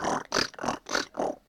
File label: gator long